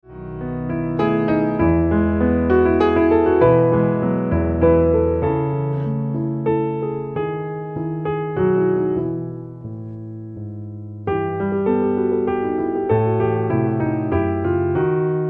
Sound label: waltz